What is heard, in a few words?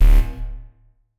Juno Bass D